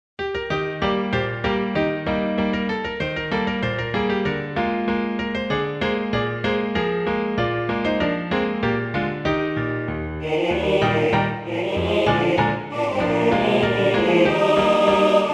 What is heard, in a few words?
SATB